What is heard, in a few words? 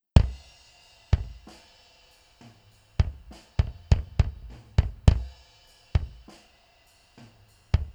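bass drum, drums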